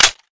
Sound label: lightning clip out, weapons